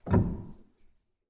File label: rel, Grand